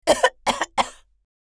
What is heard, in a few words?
Elderly woman coughing